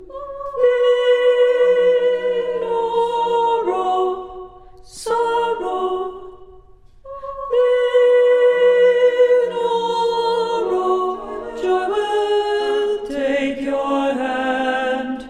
alto

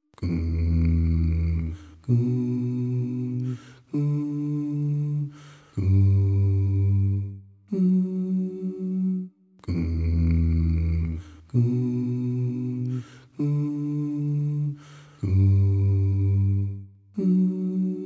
practice track Bass

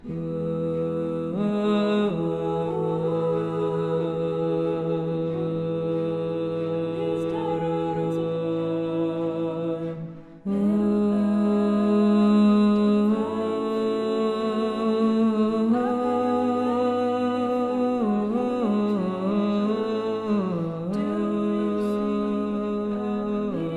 Practice Track Tenor

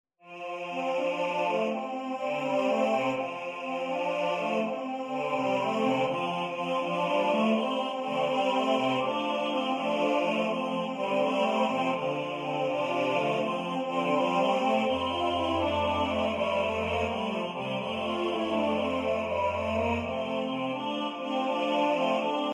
Garden Hymn TTTBB

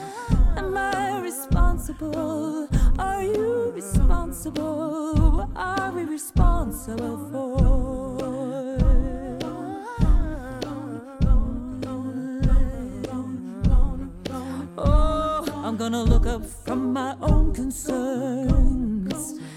practice track SSATB

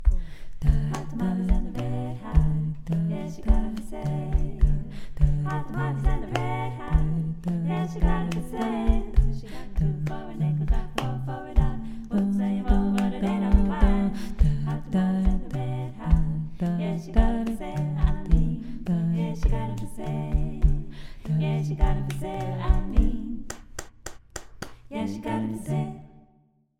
Red Hot Practice Track Bass

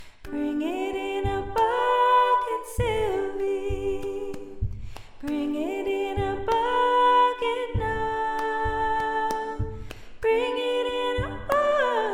SSA in G

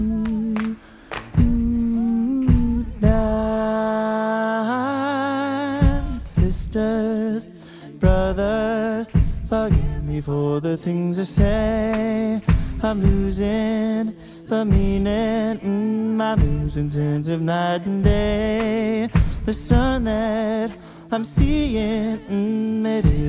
tenor

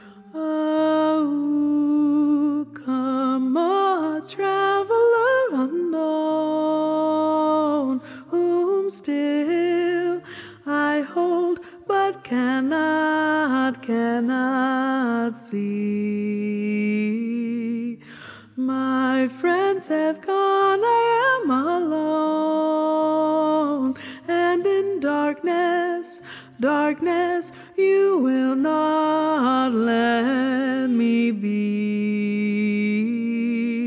practice track alto

